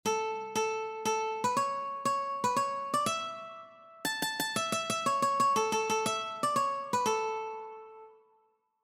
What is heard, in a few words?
Row Row Row your Boat One String Version Ukulele